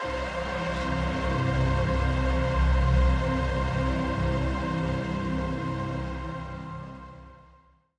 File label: Suspenseful Ident; soundscrate